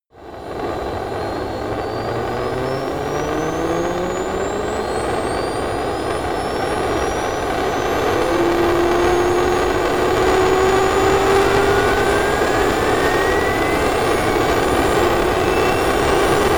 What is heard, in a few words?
military jet fulcrum turbine rev up